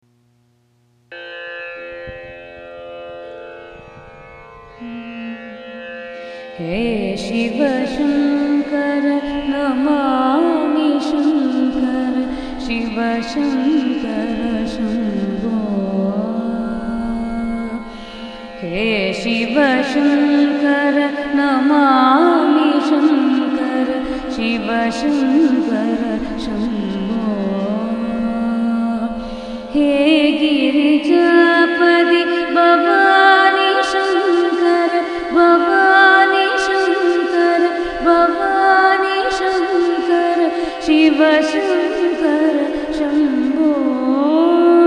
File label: Bhajan; Shiva Shankara